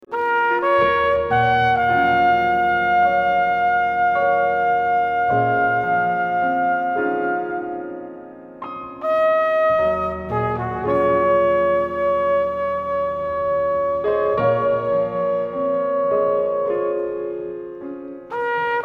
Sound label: piano and trumpet